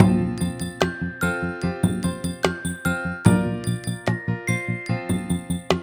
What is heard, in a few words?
upbeat music; dyslexia; ka ka ki kii